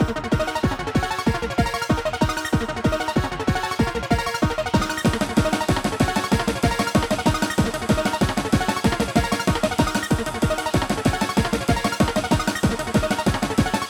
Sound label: battle synthesizer